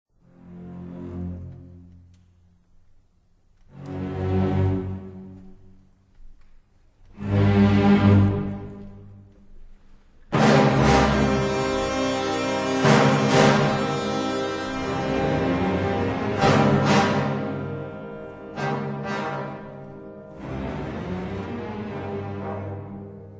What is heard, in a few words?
Death Chord